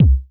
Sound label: Kick, Korg prophecy drums